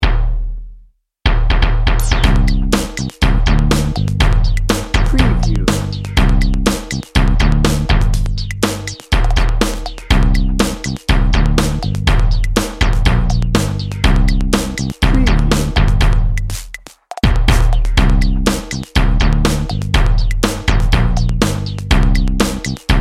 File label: swagger groove dnb